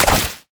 minefield; LoL; ziggs poolparty

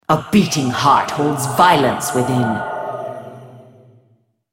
move; BlackIceAnivia

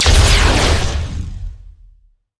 hypercharge; LoL; jayce

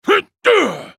spell cast effort; Garen; Character Voices - English

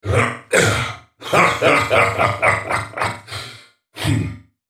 laugh; Jayce Sentinel

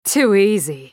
ultimate effort; Katarina; Character Voices - English

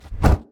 dragon wing, monsters; LoL

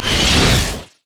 carpet bomb; rumble skin03

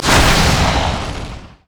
chaos storm; LoL; viktor

